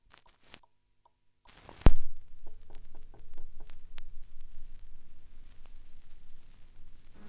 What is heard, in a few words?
vinyl; Masterbits; Vinyl Attack